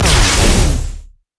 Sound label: crit attack; LoL; velkoz skin01